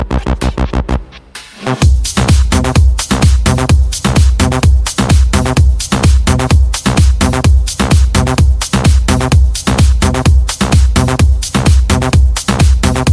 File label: Progressive